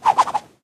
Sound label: icicle deploy, weapons; tf